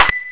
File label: shot ping